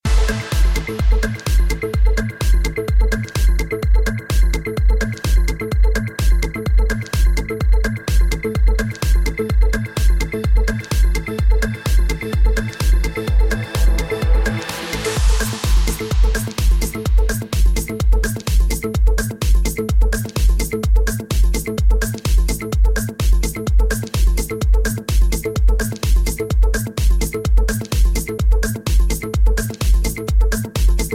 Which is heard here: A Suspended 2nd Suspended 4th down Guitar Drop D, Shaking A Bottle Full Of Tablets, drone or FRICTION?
drone